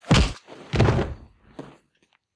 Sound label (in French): Coup de poing